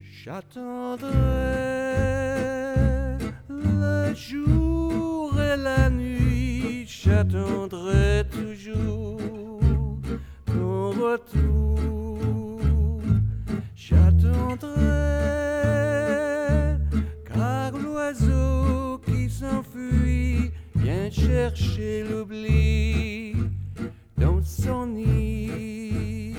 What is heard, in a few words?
voc verse rough mix